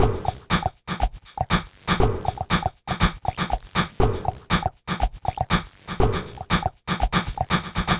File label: Minimalism, Processed Percussion